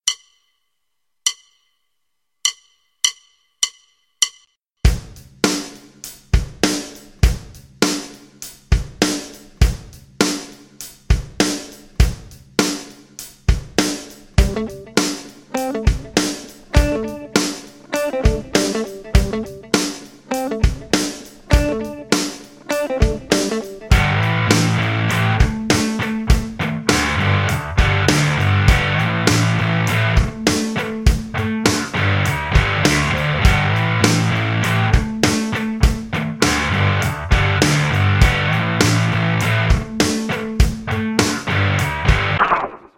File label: Drumming Track Example